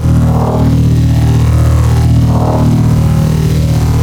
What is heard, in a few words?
Stellar Black Hole Loop; Servants of Necroterra